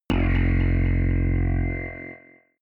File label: synth bass bounce whistle short